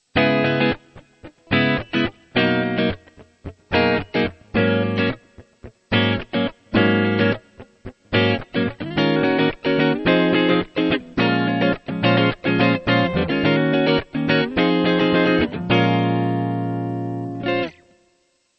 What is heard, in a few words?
key change